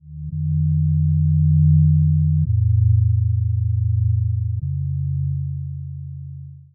Sci-Fi Electronic Power Source; Science Fiction

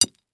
Metal Stake Stabbing Dirt; Sports Stake

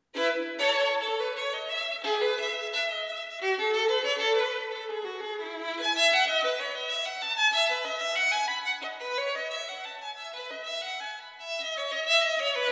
Bourrée, Solo; Yuval Yaron; J.S.Bach - Sonatas and Partitas for Solo

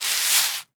Foley Rock Salt Sliding On Cement

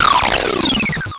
Title Transition Digital; Adobe; Production Element

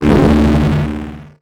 Transition Hit Impact Distorted; Production Element

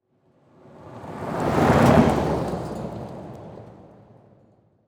Car Drive By Dirt Road; transportation

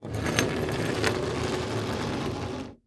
Industry Movement Chain Dragged On Solid Wood

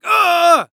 Cartoon Human Male Scream In Pain Scream; AdobeCC

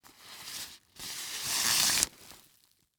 Sports Stake Wood Stake Sliding Into Tent

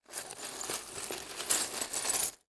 Glass Debris Glass Scraped In Pile; Foley

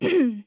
Human Female Clears Throat

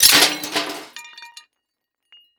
Impact Glass Debris Dropped On Trash Can Lid; Impacts

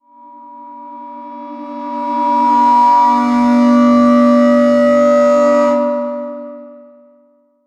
Title Transition Feedback High Pitched; Production Element

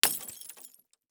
Crash Beer Bottle Break; Crashes

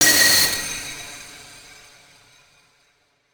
Title Transition Feedback Blast

